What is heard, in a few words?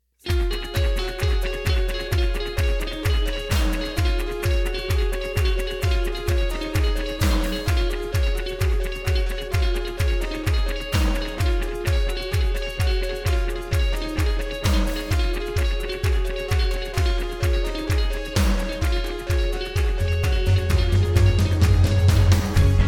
intro detuned